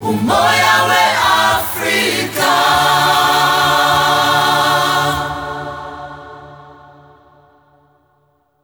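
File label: AFRICA AAH, MIXED CHOIR; Spectrasonics; Heart of Africa 2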